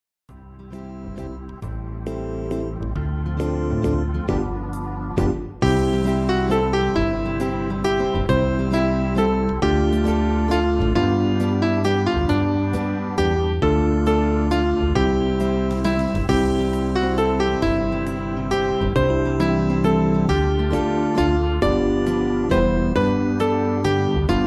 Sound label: banjo song waltz